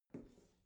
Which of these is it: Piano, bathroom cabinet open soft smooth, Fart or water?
bathroom cabinet open soft smooth